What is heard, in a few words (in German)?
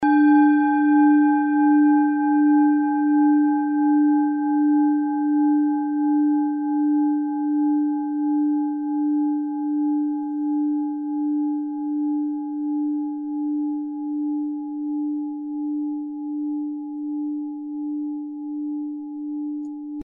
klangschale set; Klangschale Nr.6; Gewicht = 590g; Durchmesser = 14,5cm; Höhe = 7,2cm